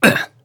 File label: Grunt Attack; Paladins; Lex